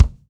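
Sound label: BD, bass drum; Best Service Gigapack 2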